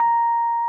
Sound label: RHODES A#4; Sonic Reality - Vintage Timetraveler